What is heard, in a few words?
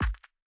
Kick Wall; Game rip; MiSide